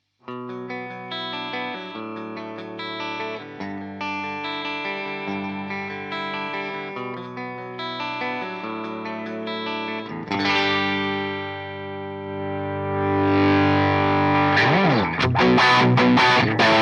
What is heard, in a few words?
Les Paul dynamic